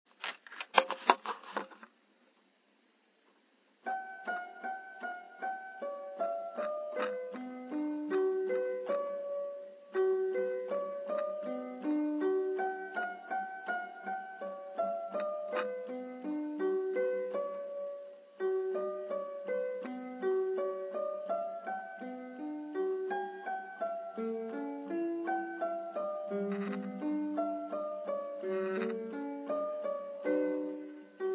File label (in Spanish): para elisa piano